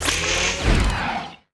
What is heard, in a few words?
small doors open; Business skieme Beta 1